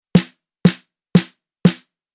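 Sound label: Rhythm Disco snare drum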